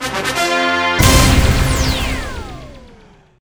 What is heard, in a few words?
Mission Complete; City of Villains soundtrack